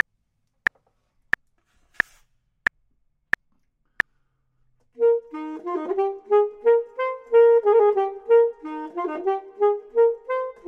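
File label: top, Saxophone and Clarinet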